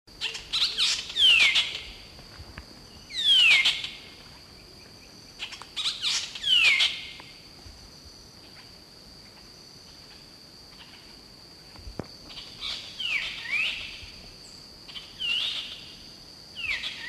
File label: black bellied cuckooshrike